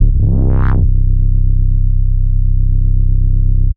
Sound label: Tech Bass C0, techno 120bpm; Bass Multi; musicradar